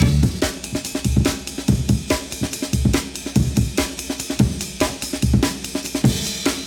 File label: Drum Loop